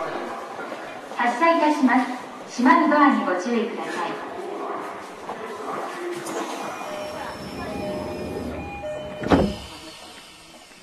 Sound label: vvvf, mitsubishi